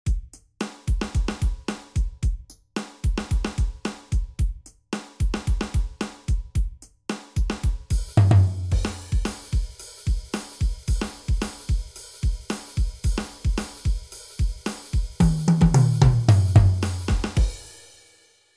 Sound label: Yamaha FGDP-50